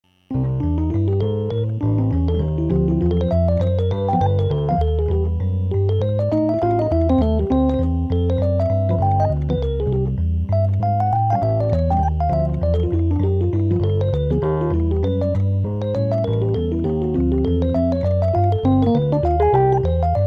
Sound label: etude C, jazz piano